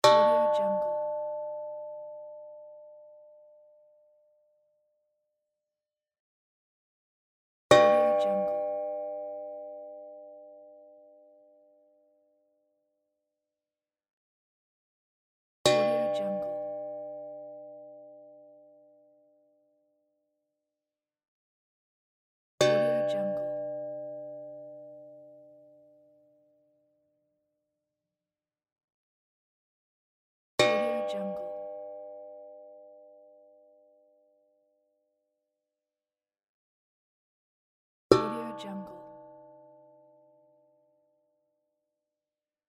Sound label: pot hits